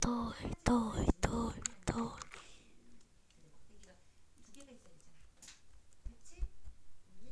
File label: toy